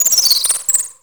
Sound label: machine chirp